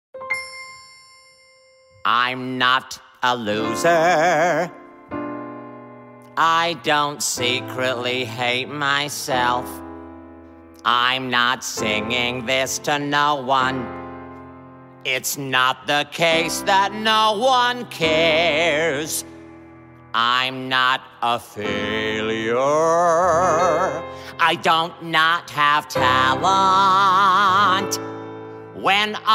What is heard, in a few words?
with Vocals